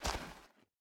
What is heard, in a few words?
Step Ground; Game rip; MiSide